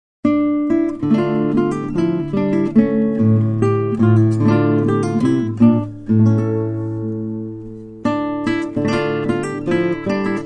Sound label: gavotte, guitar; visee; Suite